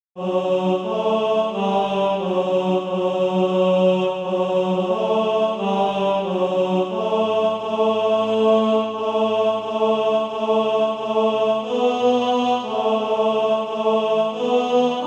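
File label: tenor, SATB Practise Music; Christ Returneth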